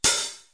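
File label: short open hi-hat; Hats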